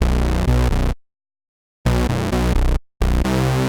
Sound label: bass, edm samples